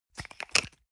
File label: toothpaste screw cup open hard